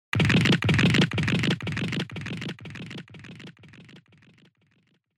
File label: Motion Fracture